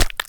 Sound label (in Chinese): 青蛙卵破坏声